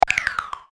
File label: Contact Signs Out, ICQ6.5